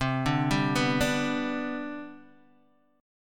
C Major 7th Suspended 2nd C down Guitar Open D, chord